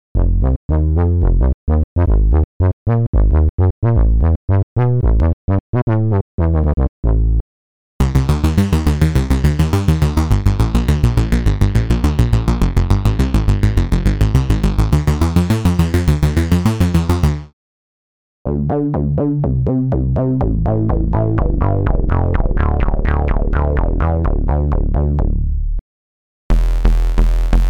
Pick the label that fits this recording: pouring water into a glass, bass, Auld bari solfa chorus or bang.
bass